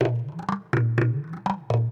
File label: PERC LOOP ETHNO 125; eJay